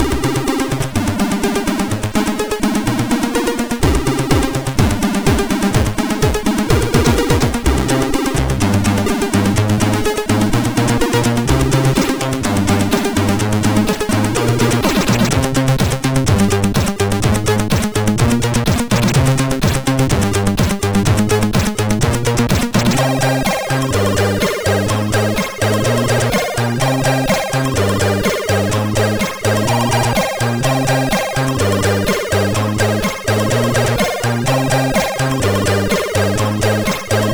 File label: MOS6581; sid MOS6581R4; Deneb